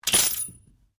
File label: RIFLE REMMINGTON700 7.62MM UNLOAD ROUNDS